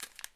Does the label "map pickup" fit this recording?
Yes